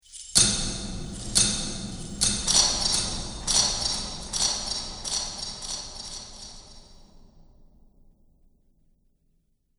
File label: Metal Chains Magic Ambience